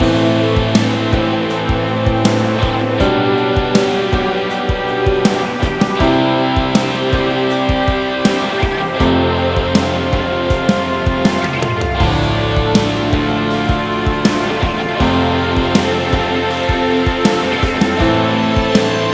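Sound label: dramatic huzun guitar version; by burak sound bank; dramatic dram vol 16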